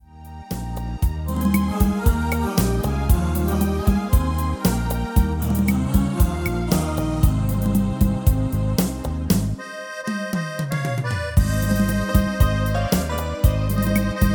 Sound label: MIDI